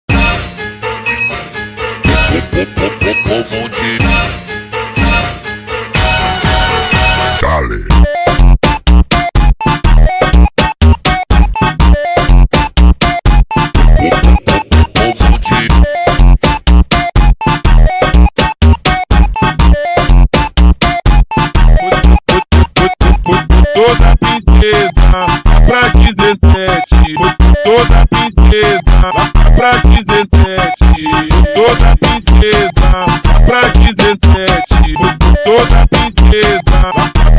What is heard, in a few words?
beeper funk slowed